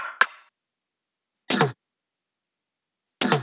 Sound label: drum Loop 140BPM TOM FX; Vengeance - Essential Clubsounds Vol.5; VEC5 Drumloops; VEC5 Multi Loops 140BPM